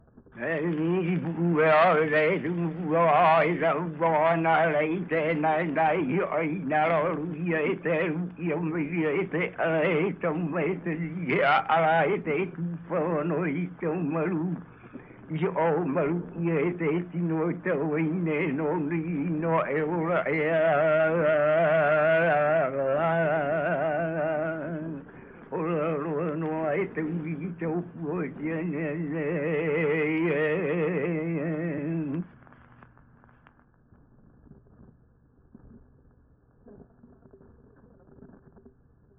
Prayer chant for healing to the goddess Hi'iaka, Hawaiian; World Music